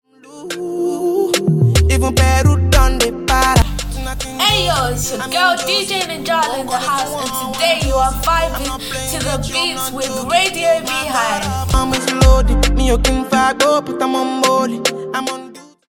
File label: Radio Jingle; Peru; Radio Bee Hive